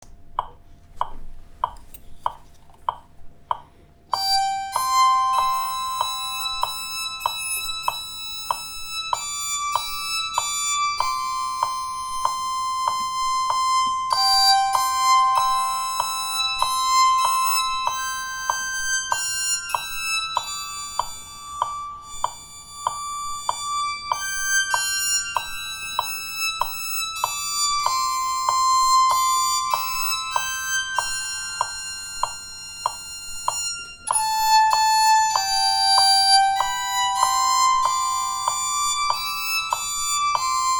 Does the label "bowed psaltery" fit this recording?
Yes